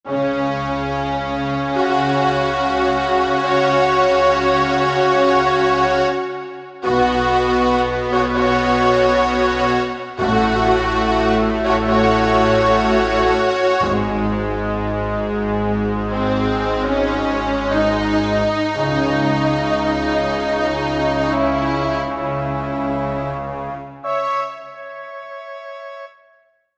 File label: Drama Orch